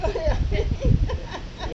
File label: haha